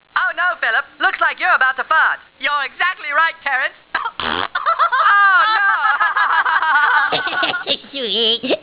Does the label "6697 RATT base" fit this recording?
No